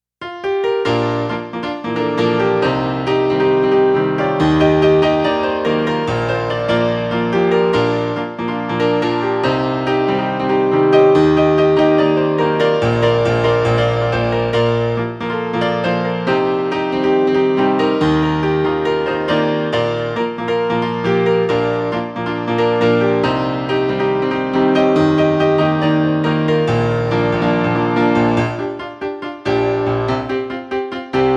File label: Piano